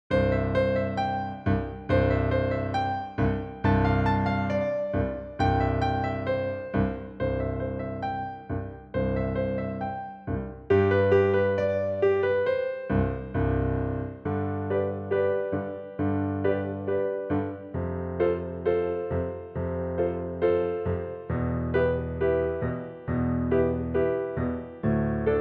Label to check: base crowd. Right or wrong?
Wrong